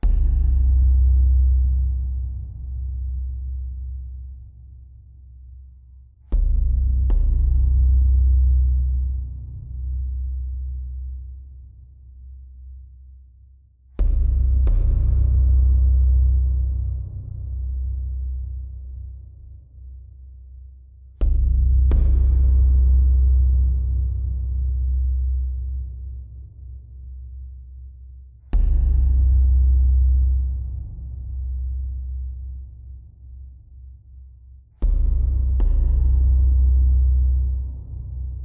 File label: Amb Level; underworld ascendant